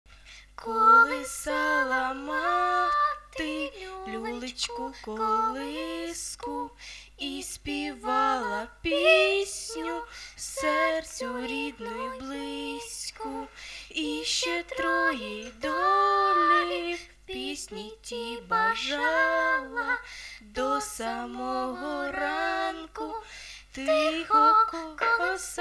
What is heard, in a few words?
Acapella